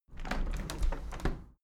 window handle plastic squeak open tilt soft; objekte; fenster

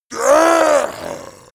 yeti grunt pain; kreaturen

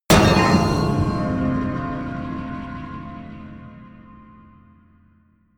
dark metal high; hits explosionen; metall

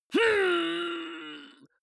male fight pain groan long; vocals; comedy-cartoon; soundpool

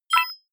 notification bleep

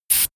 car tire valve

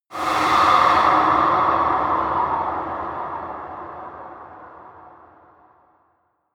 air short storm; whooshes rises

